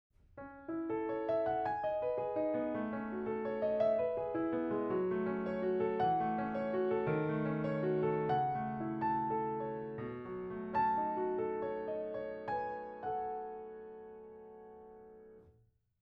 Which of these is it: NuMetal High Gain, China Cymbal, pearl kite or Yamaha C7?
Yamaha C7